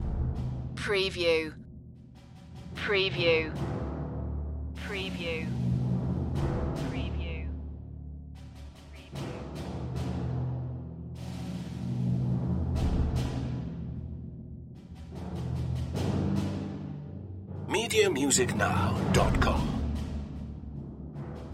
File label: percussion loop